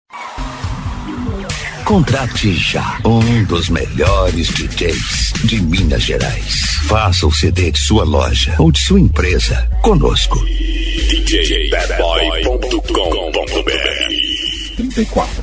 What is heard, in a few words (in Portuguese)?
funk rave automotivo